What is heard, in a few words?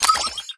Place Component; TechTree